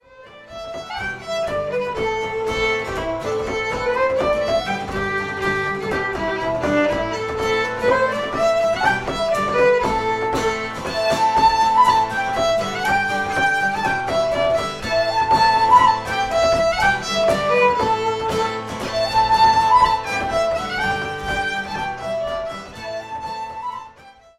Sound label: Ballydesmond Polka